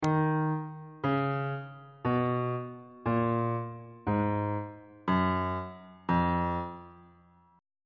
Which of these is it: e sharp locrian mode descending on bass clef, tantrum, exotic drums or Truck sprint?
e sharp locrian mode descending on bass clef